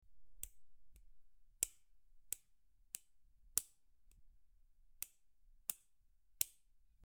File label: Nail clipper